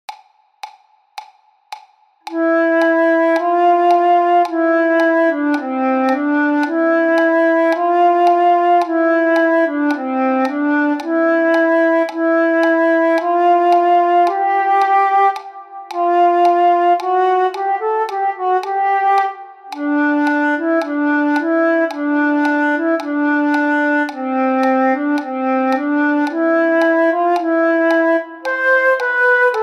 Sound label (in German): 1. Stimme